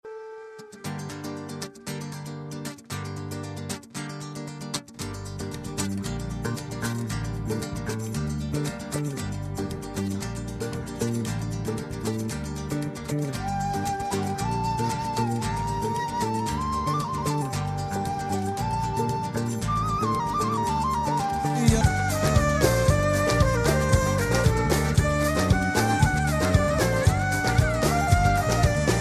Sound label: Sufi Ringtone